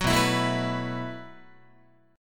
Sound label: B 7th Suspended 4th B down Guitar; B7sus4; strummed